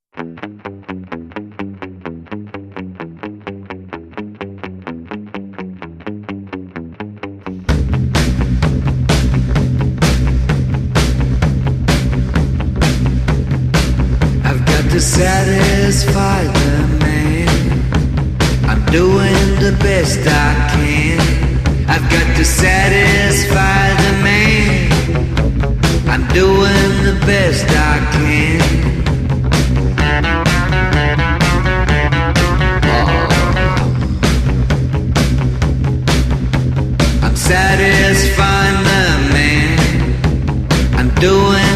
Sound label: power solo